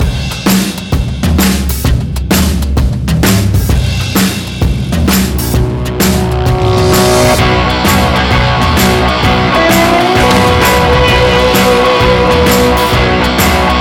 Tough Grind

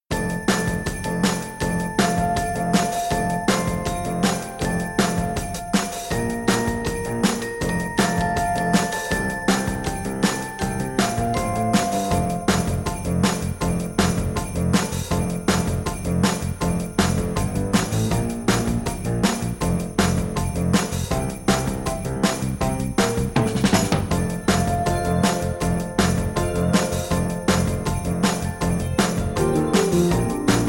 Jingle Bells Instrumental

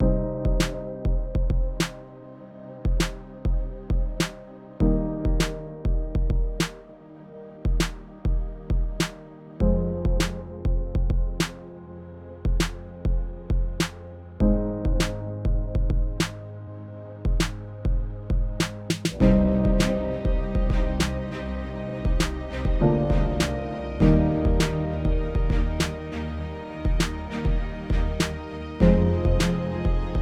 Faster Beat

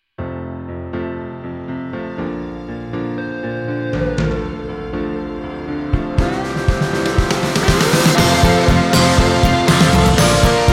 American Pride Classical

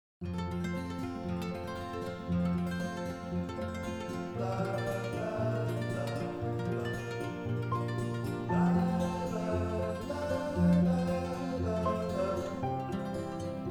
Vocal

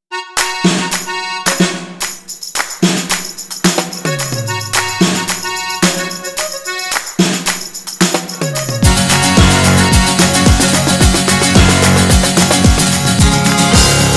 Accordion

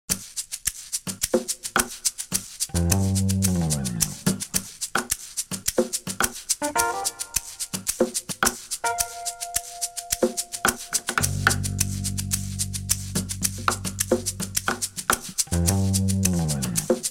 Funky

